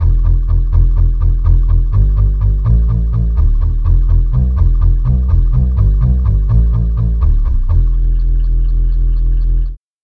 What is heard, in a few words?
recorder; bass